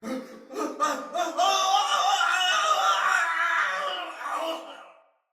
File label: Death Fire, Soldier Male; Battle; Barks; Dialog; Sinecure Soldier Male B